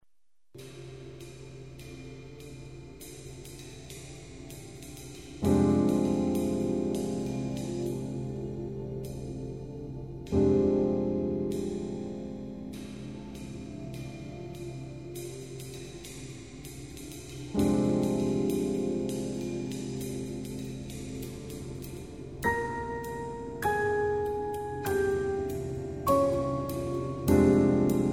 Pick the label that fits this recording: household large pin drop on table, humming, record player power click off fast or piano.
piano